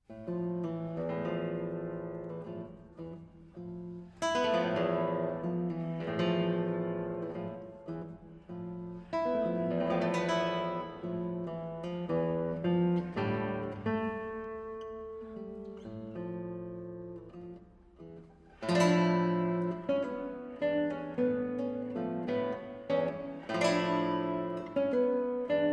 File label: guitar